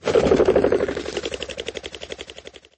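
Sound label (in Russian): звук взмаха крыльями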